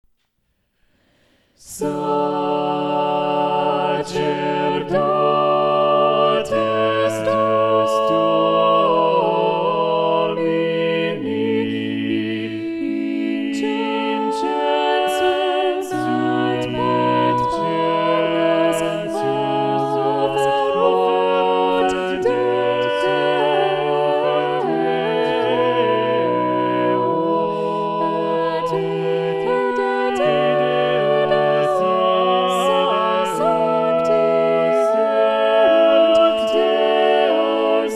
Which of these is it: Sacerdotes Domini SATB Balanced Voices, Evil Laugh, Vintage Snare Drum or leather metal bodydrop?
Sacerdotes Domini SATB Balanced Voices